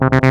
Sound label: dance music bass